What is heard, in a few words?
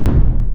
smg shoot giant